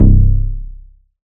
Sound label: BASS Perfect Donk (C); Cymatics; Orchid Sample Pack; 808s & Basses